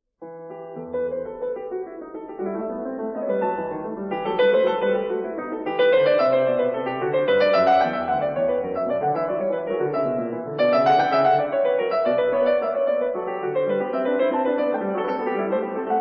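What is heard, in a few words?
No.10 in E minor Prelude, piano; BACH; WTC; BOOK II; Feinberg, Samuil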